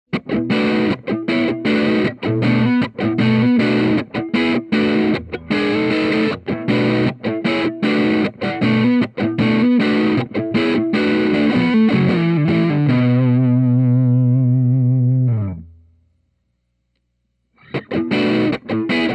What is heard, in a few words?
double cut humbucker distortion, guitar